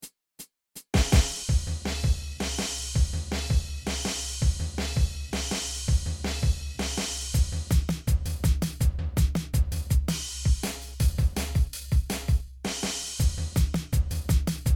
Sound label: three hits drums